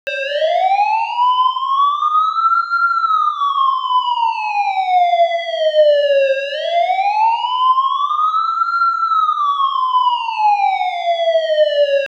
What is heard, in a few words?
ambulance siren us; mixkit; K9 MEDIC Instructors Sound Board